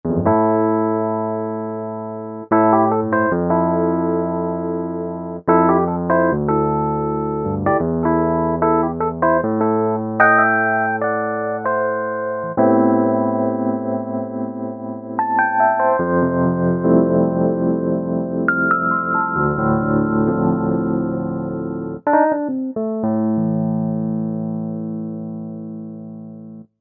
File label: Vintage EP